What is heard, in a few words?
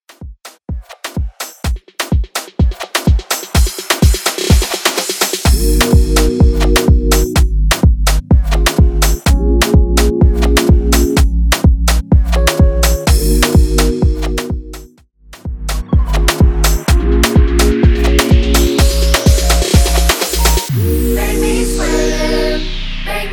Afro House Remix